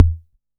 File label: Analog SR88 Kick